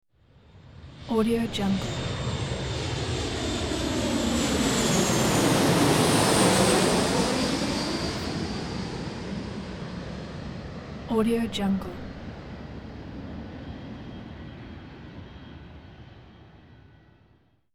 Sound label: airplane fly by; عبور هواپیما از فاصله نزدیک